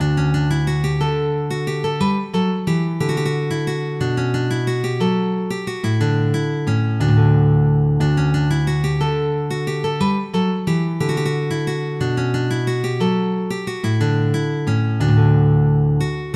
lute; Equivision: Polo Thrills

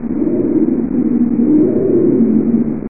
large Troll; AmigaMUD

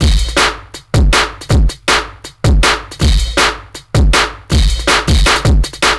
DRUMLOOP D+B 160, Drum+Bass Loop; eJay

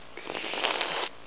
BUDLICK; bud frog

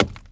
Work Chop, Ambient; PC Gamer IT CD 46

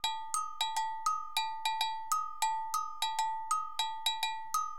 AGOGO, Perc; LiveAct Pool; AcidJazz Funk

